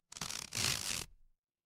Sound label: cartoon stretch